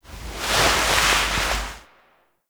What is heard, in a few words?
Hub Apartment Foley; Warframe; Zariman; Cinematics